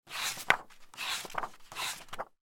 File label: page flip